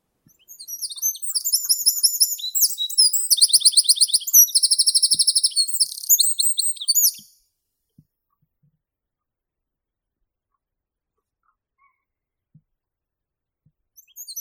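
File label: wren, Troglodytes troglodytes; krapivnik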